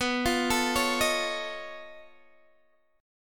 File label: B 9th Flat 5th B down Guitar DADGAD